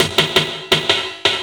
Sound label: LOOP, 160 BPM, Drum & Bass